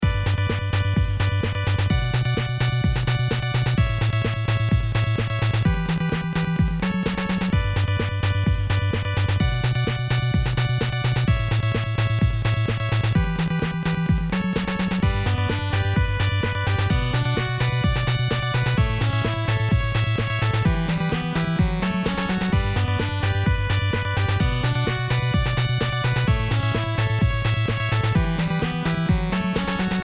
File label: arpy beat